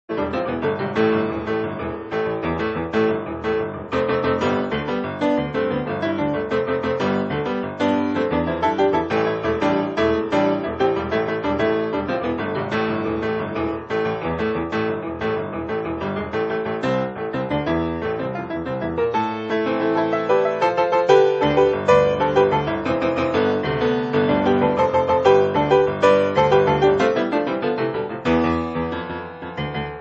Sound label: grand piano; Barbarian Ultimate Warrior